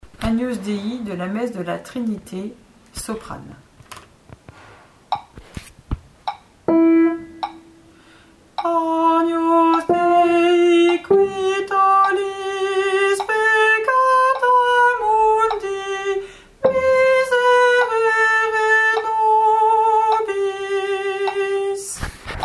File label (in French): AGNUS SOPRANE; Messe de la Trinité du V de V